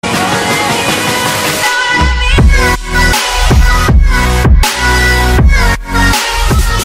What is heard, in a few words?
beeline mobile russia ringtone